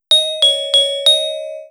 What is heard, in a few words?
Glockenspiel Glockenspiel, Deurbellen; 500 serie